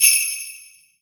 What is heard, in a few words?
Korg TR Rack Percussion Kit Sleigh Bells